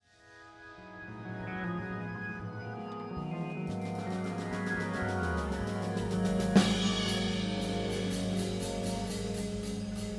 Tuning

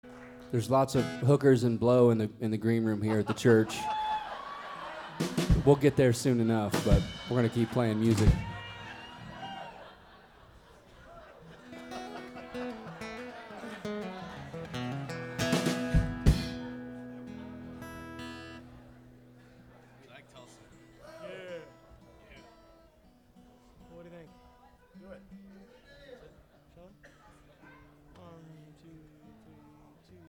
encore applause

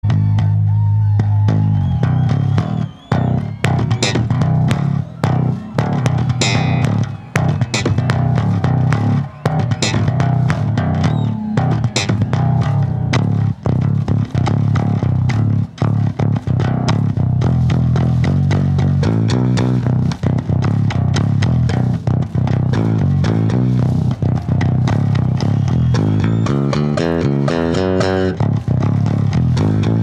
Bass Solo

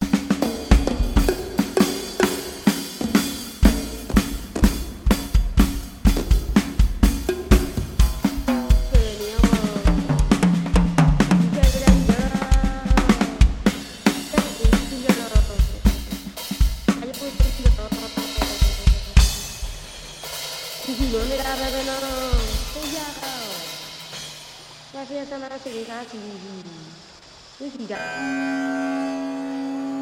Drums

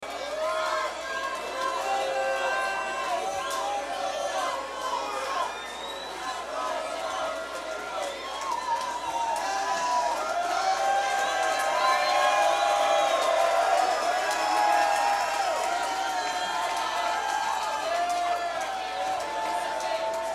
Crowd

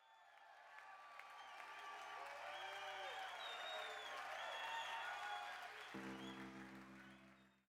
Crowd

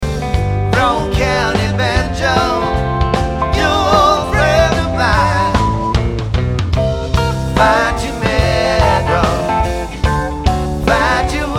Banjo

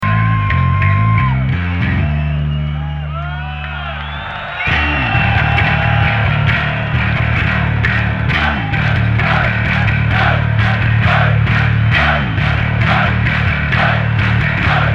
Bass Solo

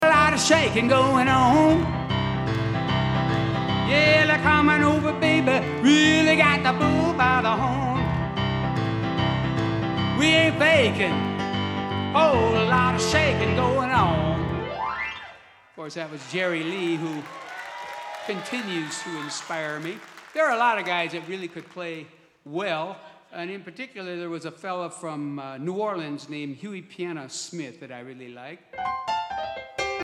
Piano Moment

